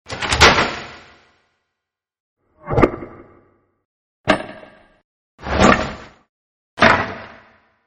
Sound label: Eerie Hit, Errie Metallic Hits; Errie Metallic Hits-5 Takes; Sound Design